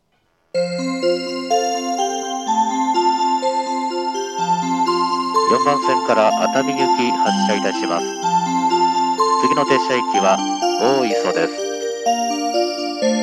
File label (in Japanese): 発車メロディー; 東海道線; 朝の通勤時間帯は混雑するうえに10両編成が多いので、乗降で2コーラス目が狙えます。; 小田原・熱海・伊東・沼津方面; たなばたさま; それ以外の時間帯は鳴ってもフルコーラスというところです。